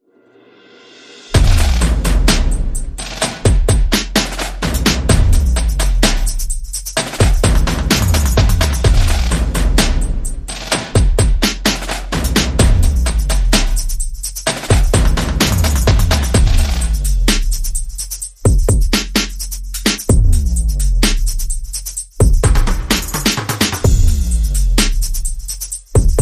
Bass Perc and FX Only

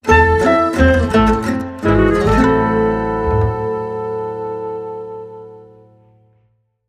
Sting

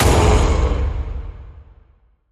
Vocal Fury Viking Hit Hyped

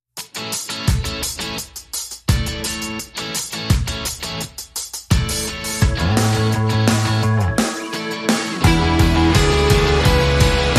No Vocals No Guitar Solo Mix

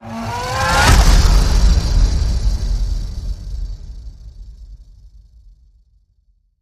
Medusa Rattle Whoosh Hit

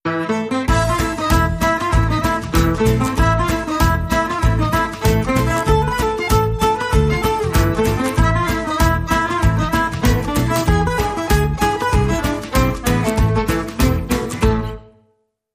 Manouche, jingle

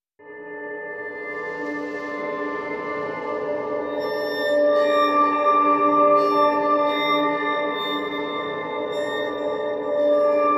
Suspense, No Banjo

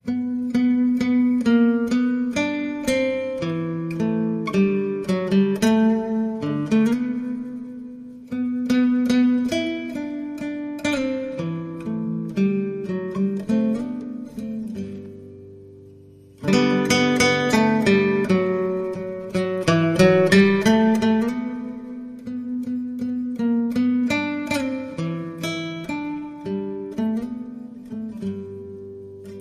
O Little Town Guitar; Trad (PD)

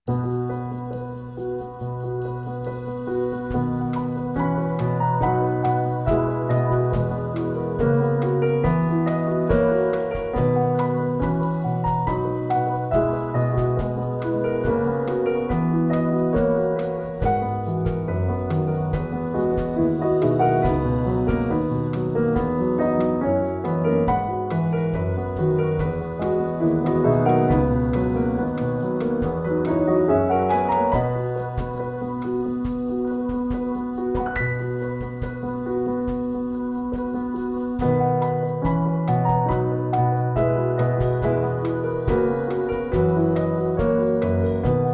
With Trio Synth Pad

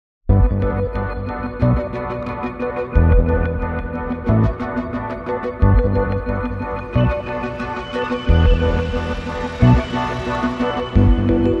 Bumper

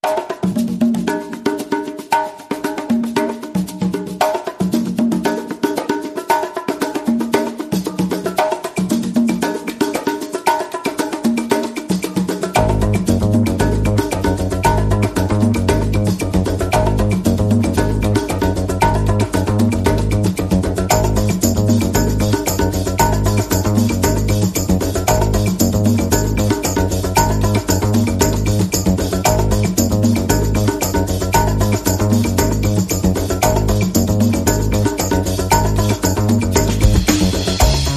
Bass and Perc Only

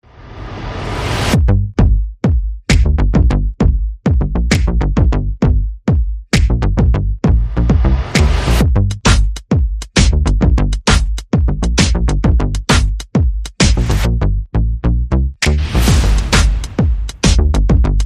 Sunshine Strut, Percussion Bass and Effects

